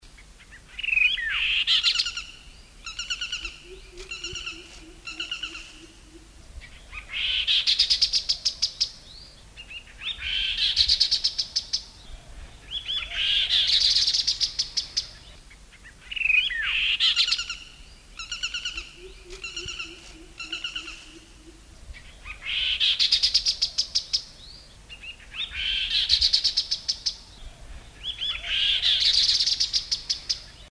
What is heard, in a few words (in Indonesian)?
tepus gelagah